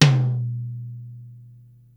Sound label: tom mono hi, Yamaha Motif ES-Series; 300 Drum Machines